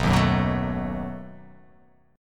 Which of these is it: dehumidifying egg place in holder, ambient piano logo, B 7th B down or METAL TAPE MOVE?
B 7th B down